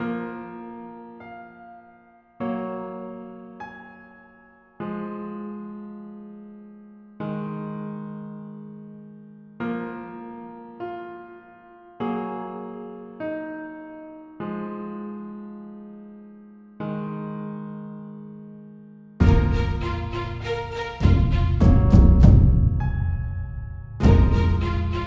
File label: Piano Rain